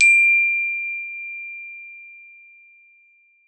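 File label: glockenspiel D5; Xsample VOL-4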